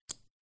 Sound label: chip pickup chip pile